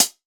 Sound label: HiHat Closed